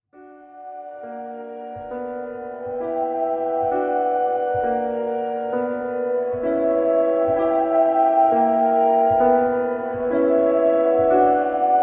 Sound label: Prelude in B minor second version, Keyboard Studies